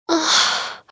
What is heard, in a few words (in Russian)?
Томный женский вздох; русские, уведомление